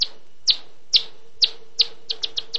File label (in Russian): pisk odnoj letuchej mishi